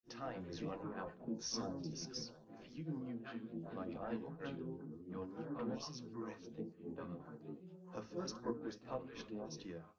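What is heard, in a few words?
male, live recording 250ms 5cm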